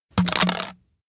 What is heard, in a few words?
pen into bottle; anemoi